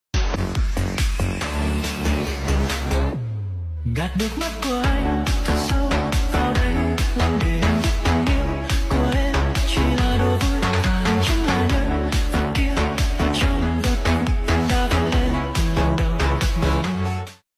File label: remix